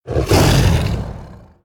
Felsaber Attack

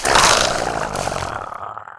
Death; Sinister Squashling

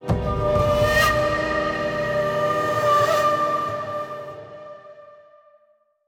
Play Stinger

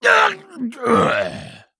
Death

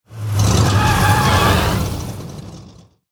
Underlay Attack; Junk Racer

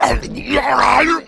Giantfin Death

